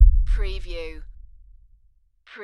LFE Hit

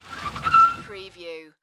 Car Tires Screech

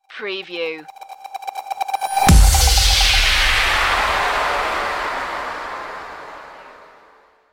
sonarp stinger

